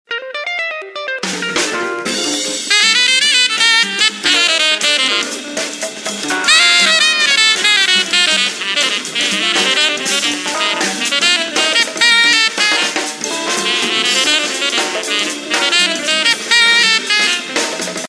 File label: Ringtone, Samsung GT-B3313 Corby Mate